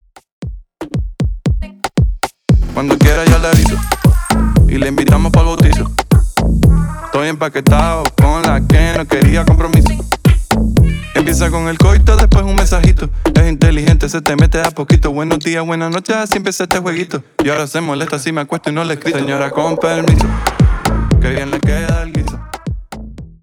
Intro Verso 117 Bpm

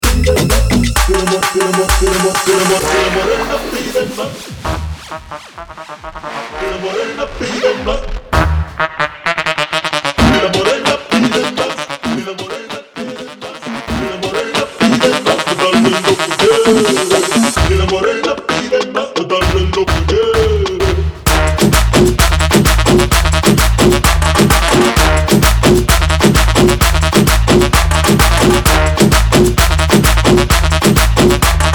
Guaracha Version Bootleg Remix 130 BPM; Prodeejay Remix

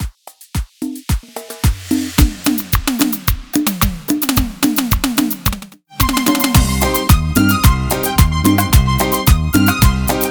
intro redobles 110 bpm; Latin music remixes

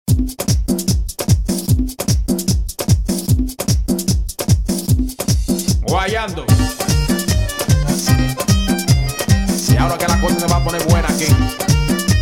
merengue

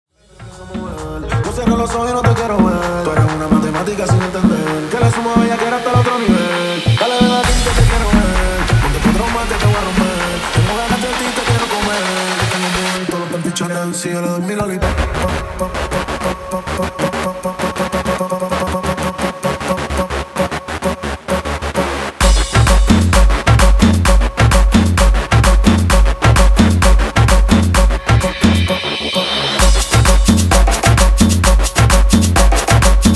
guaracha remix 130 bpm

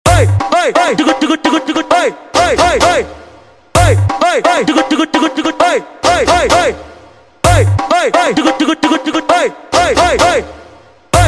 sandungueo

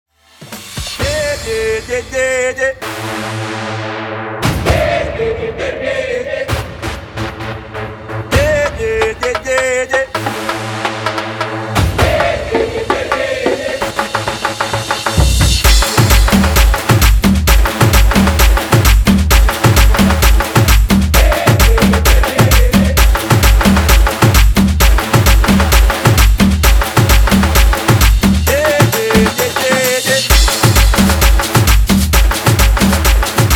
guaracha remix 130 bpm; Reggaeton dj remixes; Prodeejay Remix

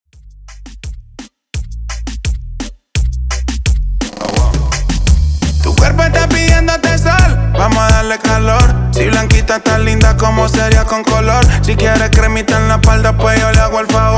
Intro Verso 85 Bpm

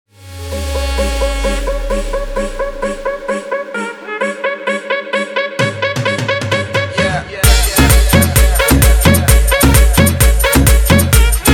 guaracha remix 130 bpm

